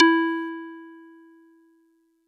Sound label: Marimba DX100 E5